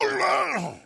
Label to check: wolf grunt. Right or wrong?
Right